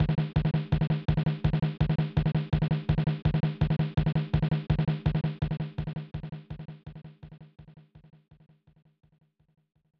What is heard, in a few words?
Drumbeat; 1994 snes; fun n games